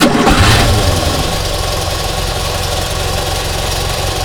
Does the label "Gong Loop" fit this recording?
No